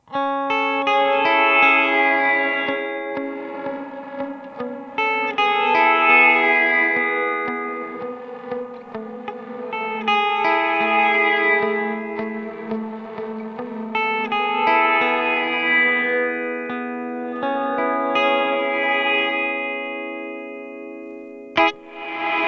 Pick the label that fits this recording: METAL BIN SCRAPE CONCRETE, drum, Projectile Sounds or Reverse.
Reverse